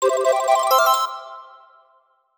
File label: Collect Coin; Donkey Kong 64; Collect Nintendo Coin-Rareware Coin